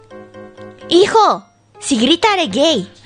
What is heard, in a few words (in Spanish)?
grito mama